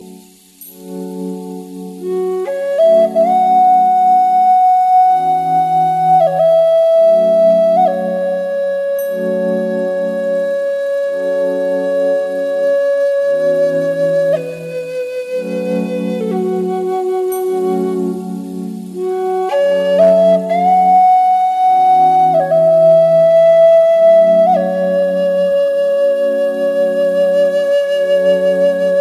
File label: native american flute